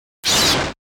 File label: fast attack; Fast Attack Hiss Harsh; Liquid Distorted Warble Production Element Imaging Element Accent Transition